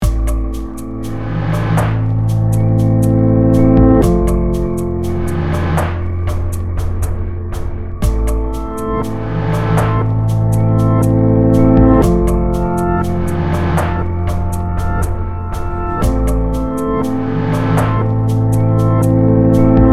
piano drone electro bpm60 sad sea; archibaldeditions

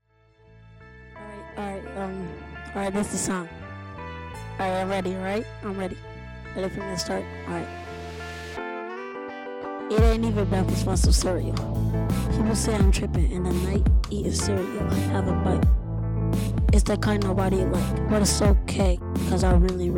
Cereal Rap

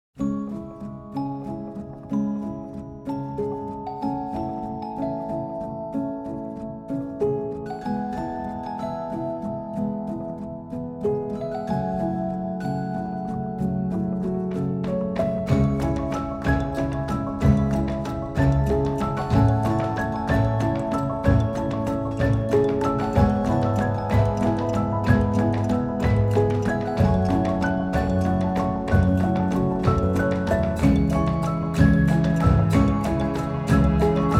Droplets - Waltzy Neo Classical

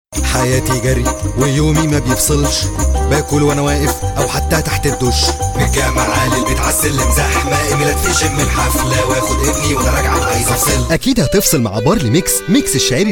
Singing

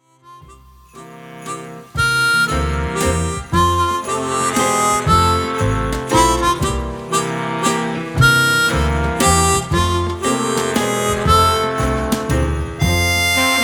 Fireside Waltz

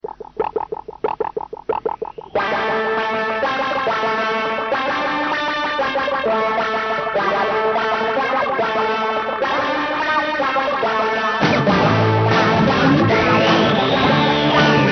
Wah Beat Voice Guitar

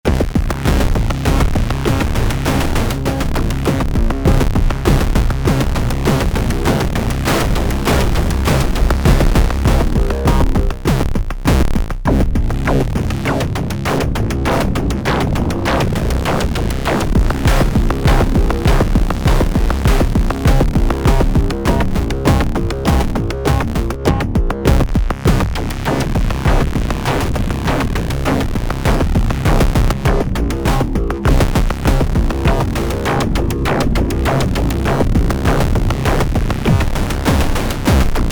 Modular Synthesis

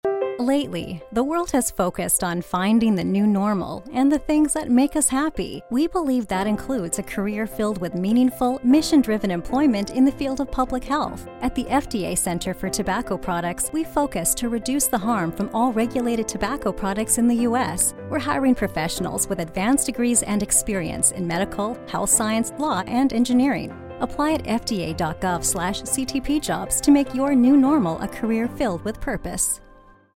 unfolding piano; FDA General Hiring

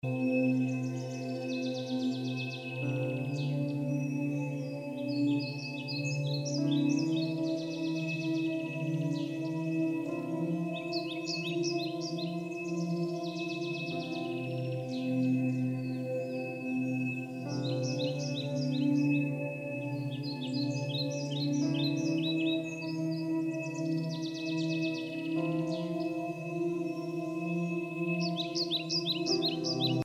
Piano Birdies, 5184Hz

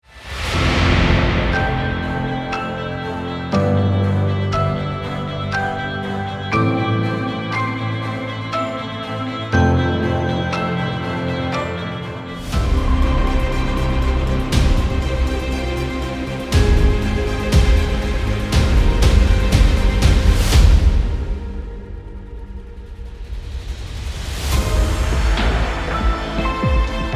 Cinematic Builds

